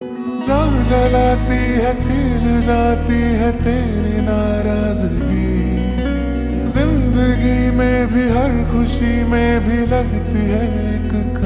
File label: Ringtone Slowed Reverb